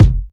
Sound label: sharp kick single shot G key; Duration 0:01